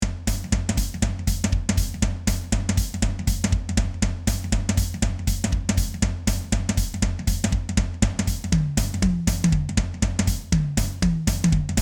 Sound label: rock drums solo 120 bpm